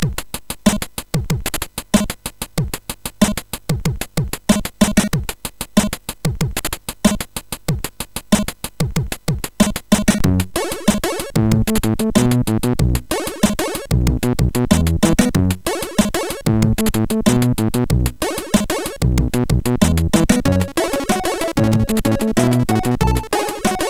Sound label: Dub, sid MOS6581R4